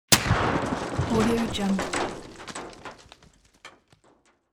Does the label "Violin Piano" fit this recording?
No